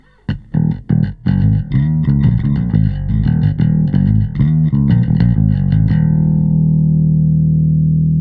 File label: Bass; Gem Comp670